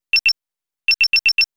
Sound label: alarming, wake up tones